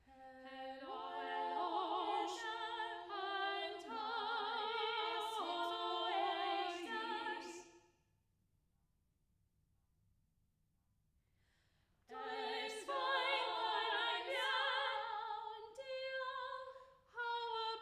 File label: trio voices only